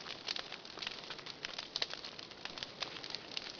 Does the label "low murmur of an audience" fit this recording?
No